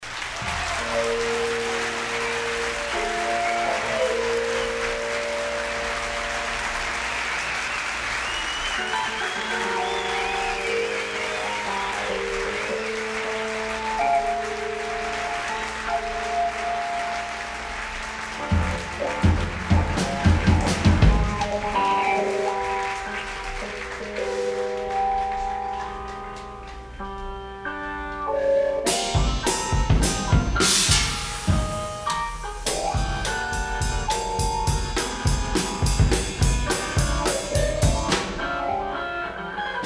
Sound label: Piano-Drum Duet, LIVE